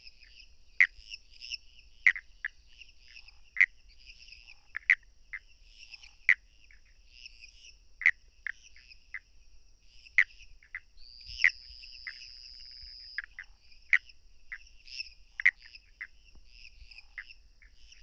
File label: Pithecopus azureus; herpetologia; cantos